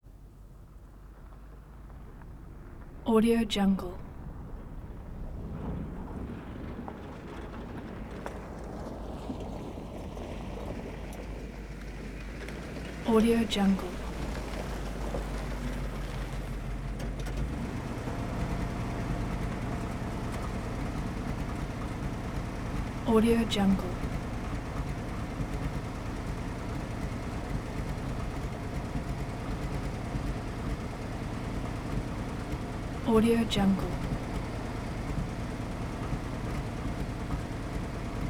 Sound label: 1973 dodge tradesman van slow drive in stop; prosoundfx